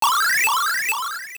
Maku Seed Glow; The Maku Seed glowing in the final dungeon of each game; also the Queen Fairy transforming in OOA; Zelda Oracle

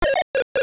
Cucco; A Cucco clucking; Zelda Oracle

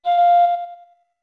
Flute F med; OOT

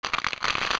Larva Hatch; Gohma

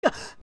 Gasp; Adult Link; Link gasping after a jump

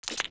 Steps Ice Land; zelda; OOT

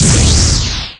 Hit Magic

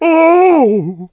Ohhh; King Tuft: "Ohhh!"; zelda

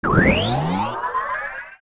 Arrow Select Fire; Selecting magic arrows (Fire, Ice, Light); zelda; OOT

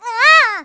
Run; Zelda

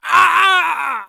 Struggle; Osfala struggling against Yuga's magic; zelda

